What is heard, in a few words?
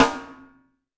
Snare, Roland TD-20